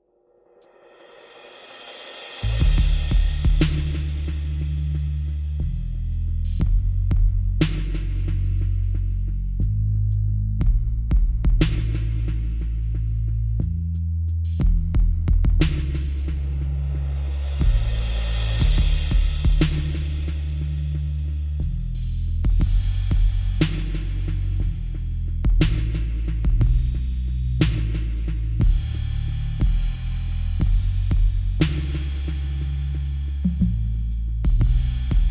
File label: Drums and Bass Mix